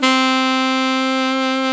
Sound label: SAX ALTO MF; Alto 414